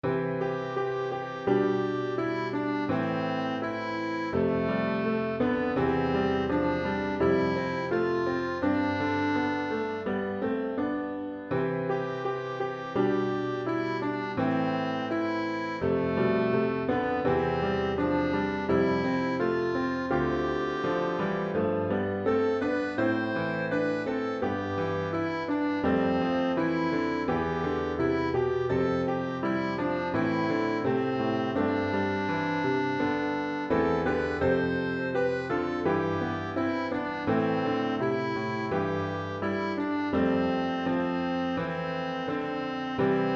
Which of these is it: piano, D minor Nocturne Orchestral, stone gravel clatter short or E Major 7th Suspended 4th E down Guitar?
piano